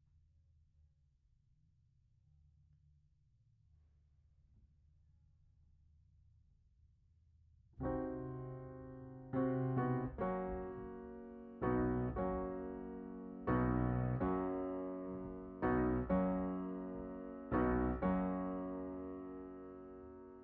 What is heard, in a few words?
Piano Mono